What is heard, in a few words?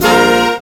HIT Band, Big Band Hits; Club-50 - Foundations Roland; Hit Combo